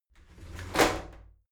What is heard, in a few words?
shower slide doors open heavy